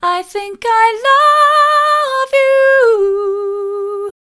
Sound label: Dual Delay Doubling Echo Dry; ClonEchoes; Syntheway